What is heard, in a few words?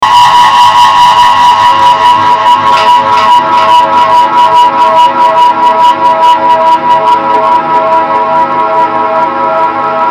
noise track